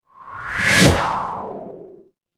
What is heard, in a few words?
transition whoosh